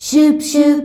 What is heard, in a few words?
"SCHUBSC" D, FEMALES